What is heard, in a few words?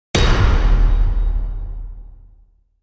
Hit